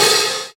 short reverb open high hat sample f key

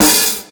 vinyl bright jazz snare sound b key

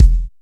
solid kick drum e key; Duration 0:01; Mono; kick drum samples

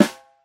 snare single hit g sharp key; snare drum one shots

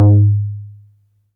subtle reverb tom one shot g sharp

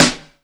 good snare one shot b key

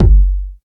boom bass drum sound f sharp key; kick drum samples

